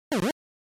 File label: Block; NES